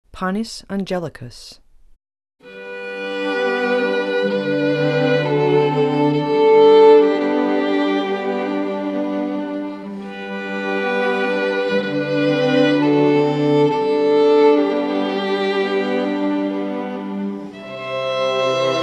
panis, string quartet

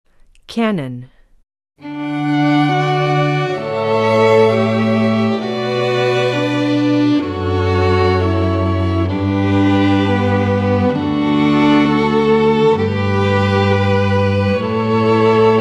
kanon, string quartet

